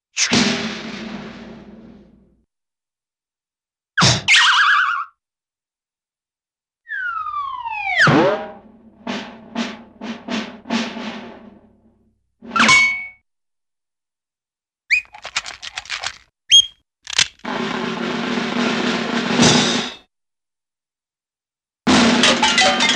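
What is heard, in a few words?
Swish with snare Roll - Metallic Crash; Cartoon; Hollywood Edge; Cartoon Trax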